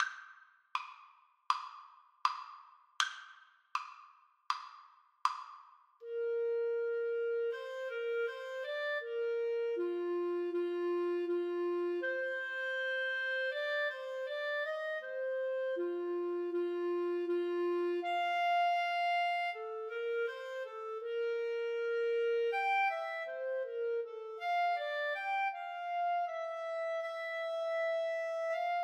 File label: Clarinet Duet